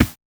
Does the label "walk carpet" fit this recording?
Yes